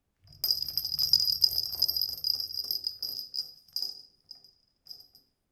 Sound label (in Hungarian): gurítás jobbról, csörgőlabda; Perkupa; játék asztalon gurítás